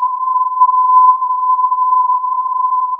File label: filtered input